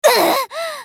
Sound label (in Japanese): ダウン; ソソ